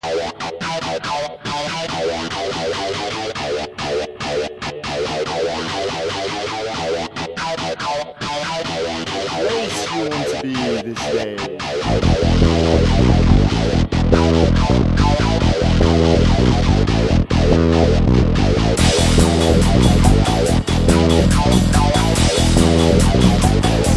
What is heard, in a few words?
blues N roll